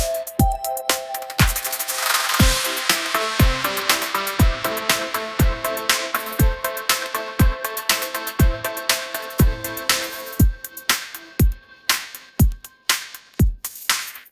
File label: sky high anthem pop, ringtones; ai-generated